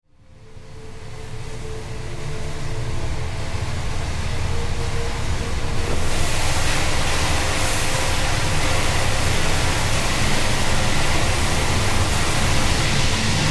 metalurgijnyj zavod; azovstal

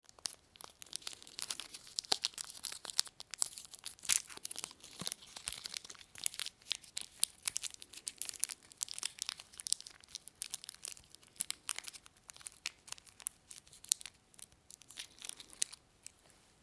shurhotinnya pid chas rozgortannya ulyublenoyi czukerky

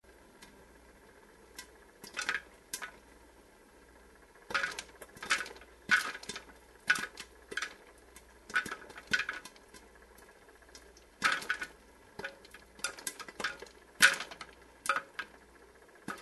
popkorn v kinoteatri

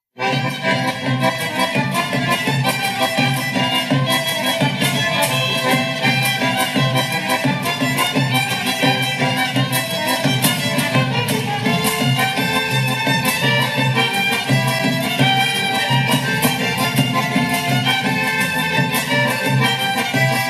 troyisti muzyky

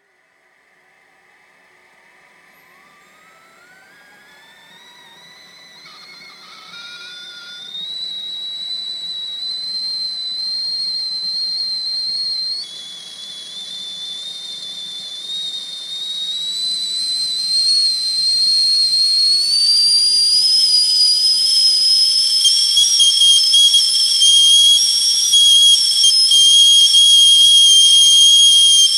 kypinnya mamynogo chajnyka

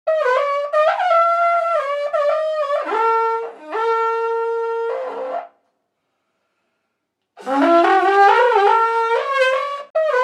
trembita v karpatah

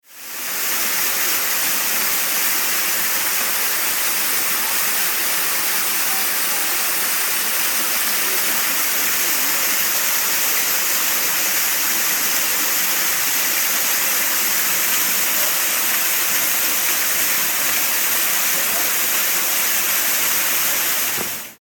vodospad guk

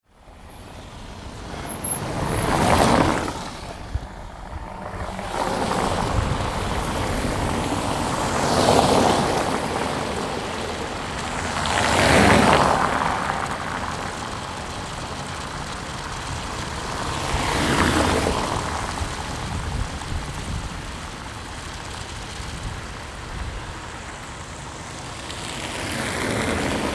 brukivka u kamyanecz podilskomu

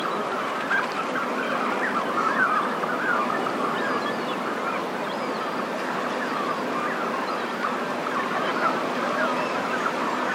baklany v krymu

